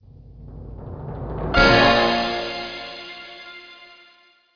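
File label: Music Surprise chord; indiana jones and the infernal machine windows 1999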